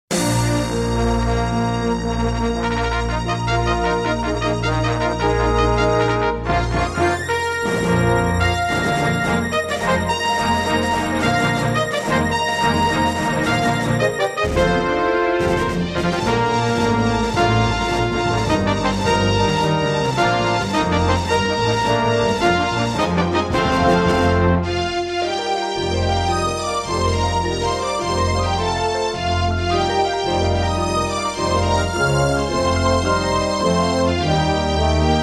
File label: Intro, Hammond GM-1000; Dark Forces